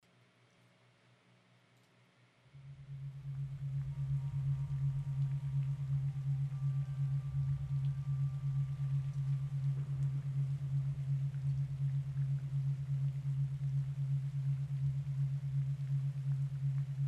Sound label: Stress Relief Thunderstorm; Gamut Point